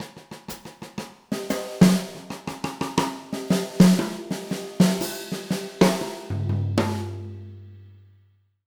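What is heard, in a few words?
breakbeat, 120; Jazzistic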